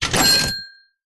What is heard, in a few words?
foley cash register ka ching